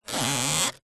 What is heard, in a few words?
household basket picnic hamper lid open or close movement creak